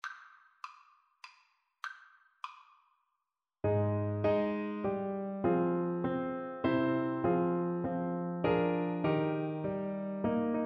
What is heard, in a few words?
Euphonium